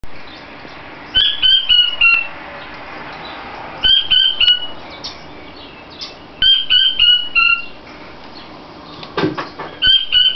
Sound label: king quail sound